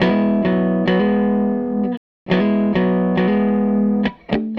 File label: Gretsch Guitar Loop